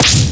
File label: Dash Attack Sound; Sheik's dash-attack noise; Zelda